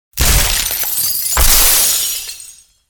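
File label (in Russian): обрушение люстры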